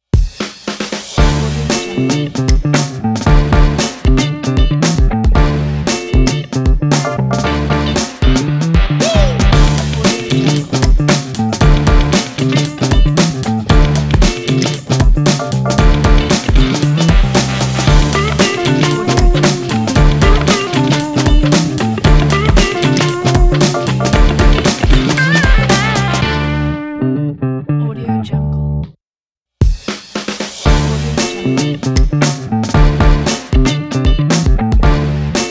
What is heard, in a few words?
hiphop uplifting funky logo